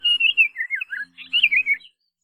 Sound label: Asian Paradise Flycatcher; birds